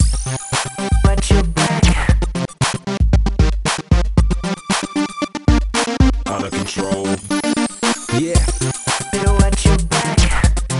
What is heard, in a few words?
Fictional Band Vocals